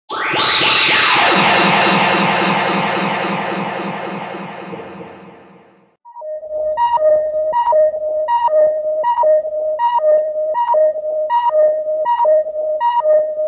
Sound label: ringtone